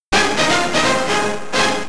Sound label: Level Complete, PCM, IBM PC-Speaker; Supaplex